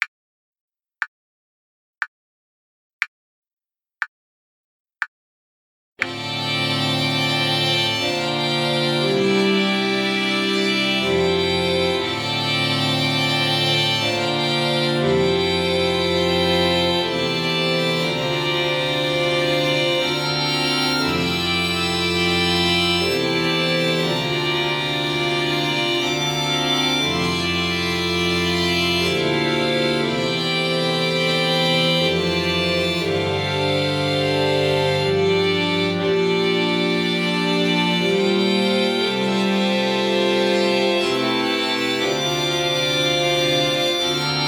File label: passacaglia chord sequence with count in